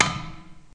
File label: crash; outfly